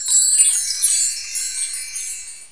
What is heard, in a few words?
BELLS